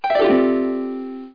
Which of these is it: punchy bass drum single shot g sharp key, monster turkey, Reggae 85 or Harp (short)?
Harp (short)